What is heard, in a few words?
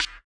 BUZZER; Spectrasonics; Metamorphosis